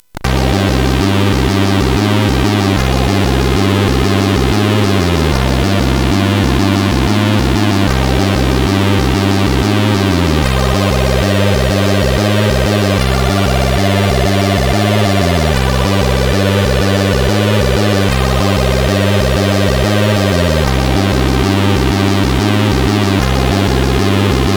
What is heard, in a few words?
Chord, CSG8580; CSG8580R5; hvsc